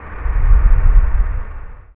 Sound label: MOVE